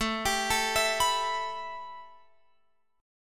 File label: A 7th Suspended 2nd, A, down, Guitar, Open F; A7sus2; arpeggiated